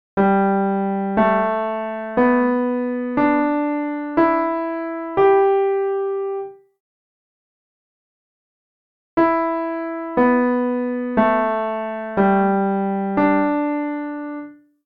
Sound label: Hard Melody